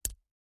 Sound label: finger break, body